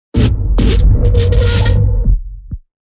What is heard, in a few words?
Metal sliding door